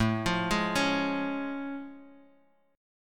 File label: A Augmented Major 7th A; arpeggiated; JGuitar